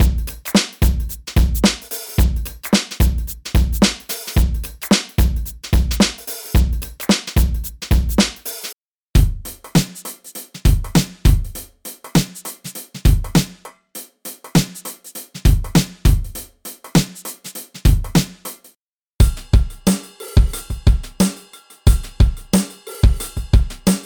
Drum Soul; Drum Monkey; Unison MIDI Wizard Plug-In; Drum Demo

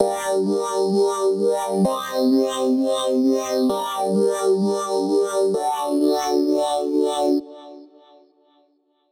Chords Full Funk; midi wizard

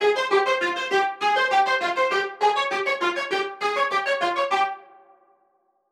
Melody Pluck Classical; midi wizard 2; Unison

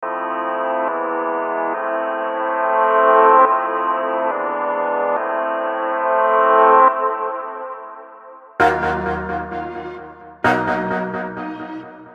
MIDI Chord Progression

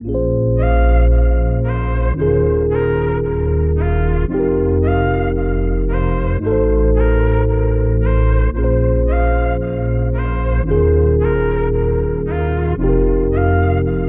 113 BPM Fm

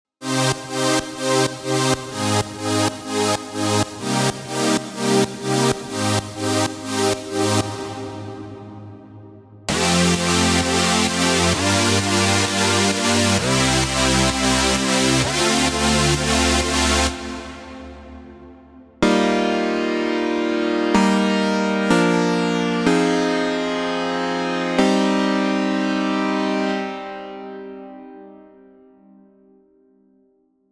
Chords Big Room Progressive House; Chord Progression Demo; Unison MIDI Wizard Plug-In

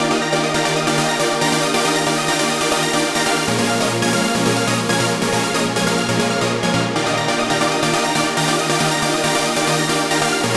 Trance Psytrance

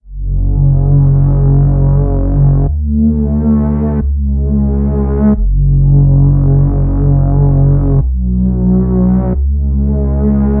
Ambient Downtempo

